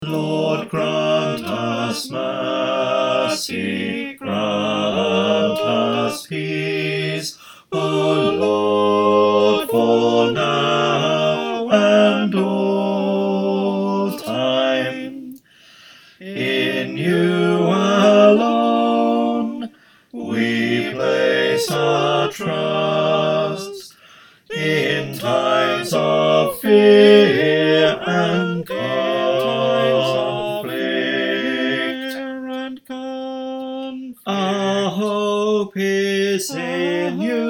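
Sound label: bass, choir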